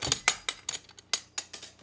chest open

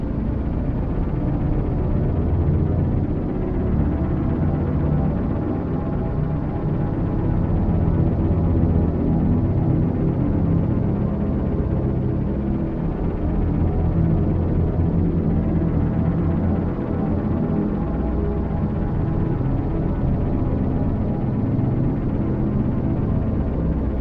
Hum; Griftlands

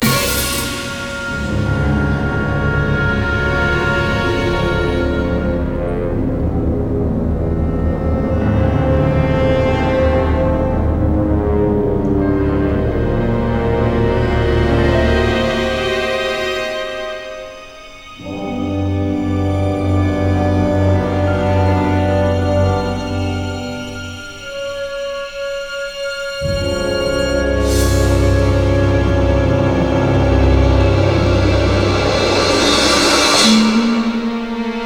Scary

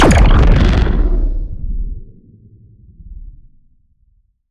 Shot Large Blaster Proton Burner

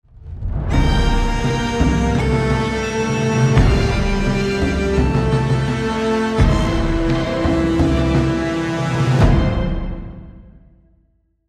Commonwealth Victory (alternate)

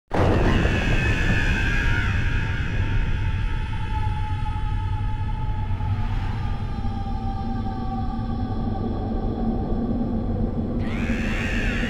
Crows & Drums; Dark Sun Wake of the Ravager; ms dos

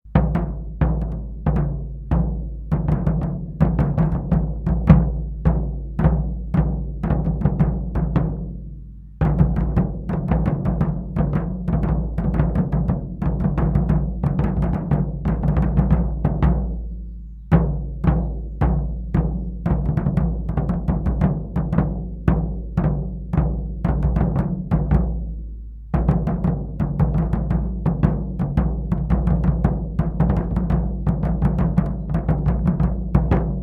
Drums Civil War March; ultimate general gettysburg gamerip 2014

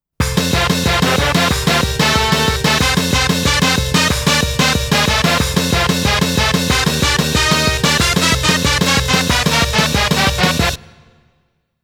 Continue; Over Top; Oshidashi Zintrick

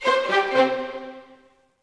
Jingle, Discovery; rayman legends the complete soundtrack gamerip 2013

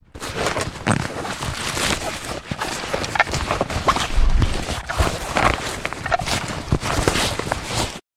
Loop Burglary Search

